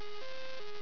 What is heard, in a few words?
newmail